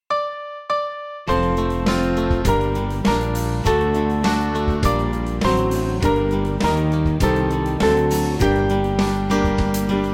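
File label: Band, Music Backing Files for Churches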